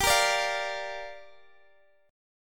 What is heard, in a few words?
G Minor 7th, Guitar, DADGAD